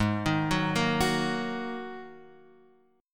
G sharp 7th Suspended 2nd, Guitar, Half Step Down

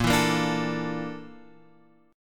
A sharp Major 7th Flat 5th A sharp

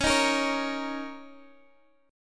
A sharp Minor Double Flat 5th, C sharp

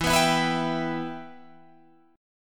E Minor E down Guitar Drop D

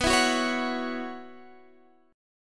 B Suspended 2nd Suspended 4th, Guitar, Standard; Bsus2sus4 strummed; JGuitar

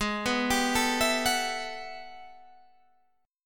G Major 13th Ab; arpeggiated; JGuitar

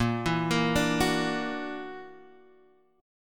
Gb Augmented 7th Bb

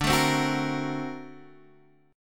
D 7th Flat 13th D, chord; D7b13 strummed; JGuitar

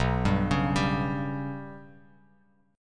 B Suspended 2nd Sharp 5th down Bass Standard; Bsus2#5 arpeggiated; JGuitar

